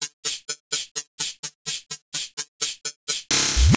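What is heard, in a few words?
Percussion, Drum Loops 127bpm; Zero-G; Soundclash Nu Dance Electro; Acidized Wavs; So Straight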